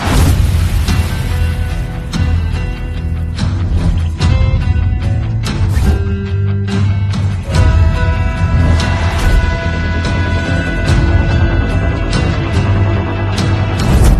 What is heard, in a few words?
BGM; Pathaan